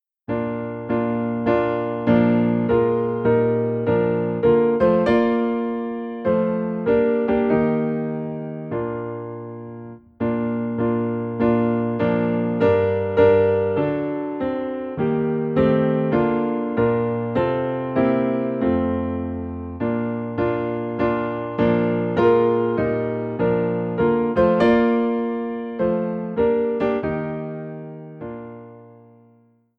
Piano in B Flat; Hallelujah What a Savior

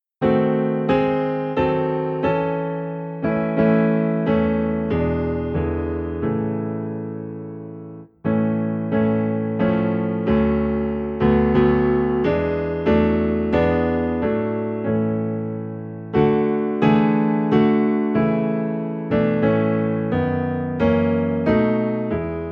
Piano - Low in A

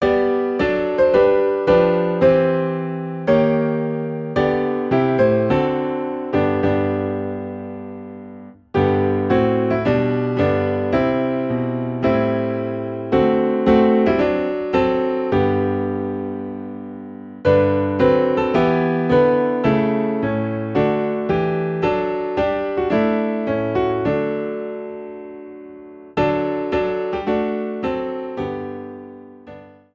Piano in G; By the Sea of Crystal

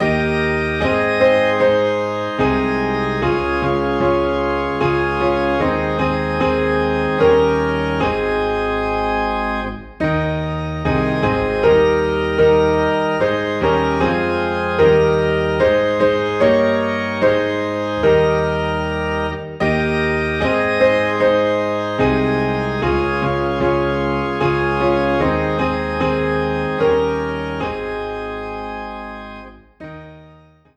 Piano and Organ in A Flat; Oh for a Thousand Tongues

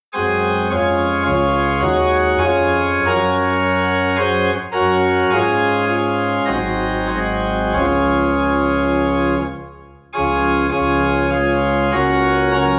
Organ in D Flat; Lead On O King Eternal

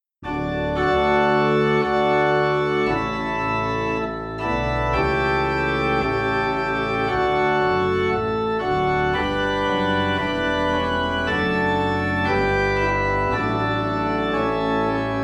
Organ Low in D

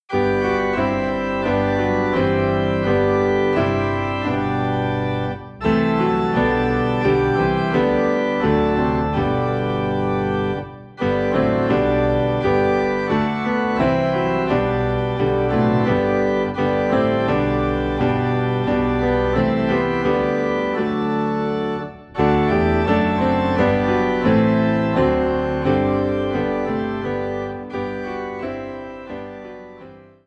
Piano and Organ Low in G; While Shepherds Watched Their Flocks; christmas

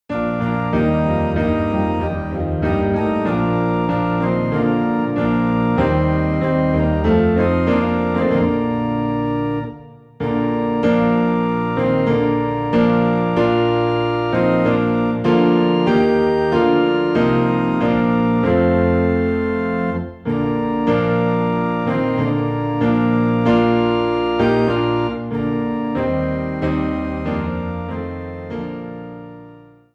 Piano and Organ Low in B Flat; At the Cross

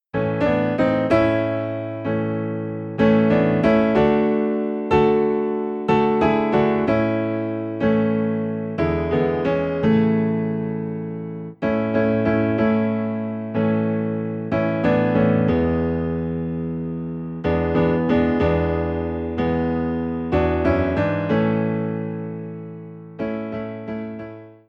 Piano, Low in A; Blessed Redeemer

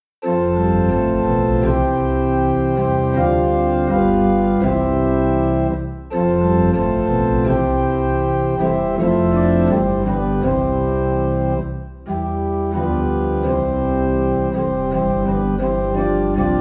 Once in Royal Davids City - Organ Low in C; christmas

